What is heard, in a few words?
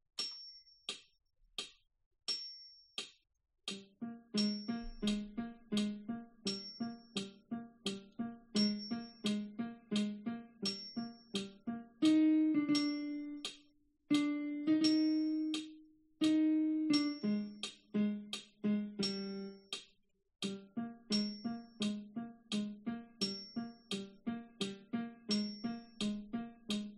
minuet viola; Beethoven